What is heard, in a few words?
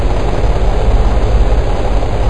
truck go